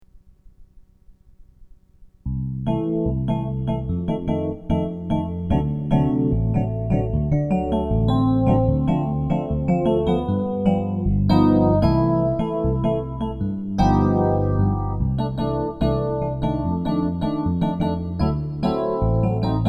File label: bossa; ladinatantsud